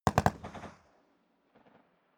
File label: rifle ak-47 exterior fire burst distant; Firearms; schusswaffen